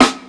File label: nice acoustic snare sample b key; snare drum samples